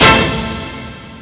mt32 hit

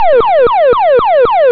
Harley; Vehicles

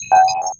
BLEEP; Gadgets; XCOM

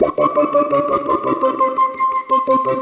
Splinkle D, Pad Loops; Cyclick Samples; Kit One

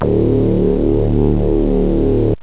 Moog Deep B

